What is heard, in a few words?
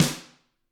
GRETSCH SNR, Double Platinum Drums